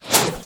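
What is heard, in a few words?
gunlance, attack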